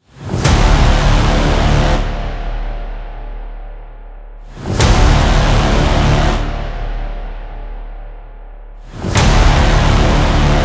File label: epic hollywood trailer; True Blades VR